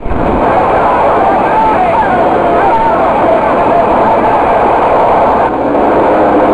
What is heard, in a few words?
Wounds; M4 7.5.2; M4™ (PowerMac)